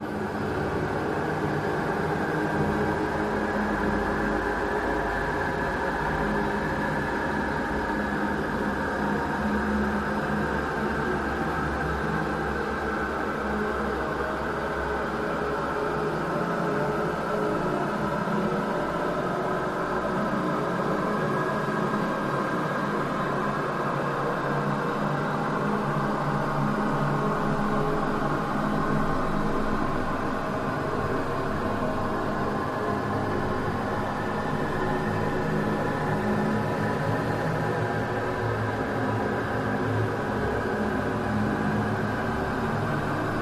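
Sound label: Atmos Heavy Wind; Terraform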